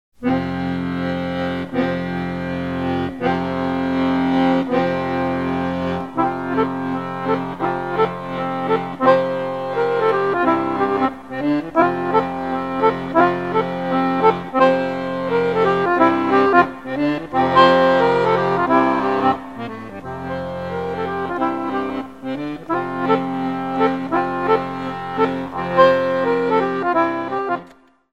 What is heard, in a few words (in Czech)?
hraly dudy